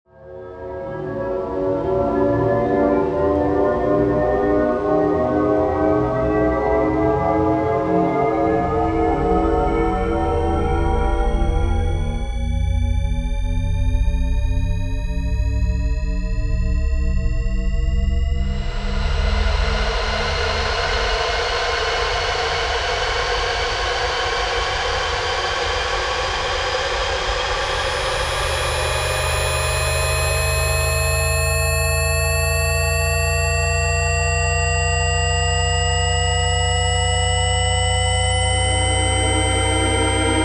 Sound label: Shepard Tone; TPMG